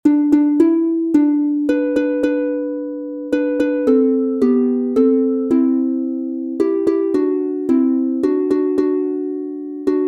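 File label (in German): G Dur instrumental, Harfe; Gold und Silber lieb ich sehr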